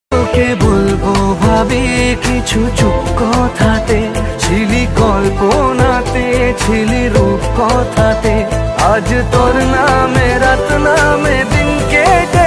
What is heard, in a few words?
Romantic Bengali Song Ringtone